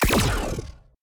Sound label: Shooting; DungeonShooting; 添加激光武器音效，还有敌人使用激光武器